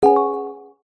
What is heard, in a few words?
multimedia notification mallet synth dreamy